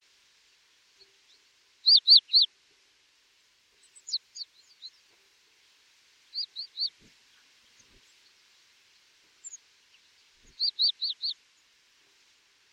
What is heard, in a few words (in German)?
Kohlmeise